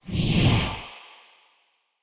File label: Spaceship FX; Cymatics; Video Game Sample Pack Vol 1